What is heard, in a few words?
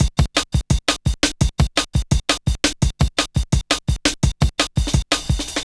Kick Break, Drum & Bass; Fluid Dynamics